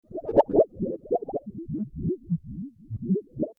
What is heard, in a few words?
Under Water Sound; FXHome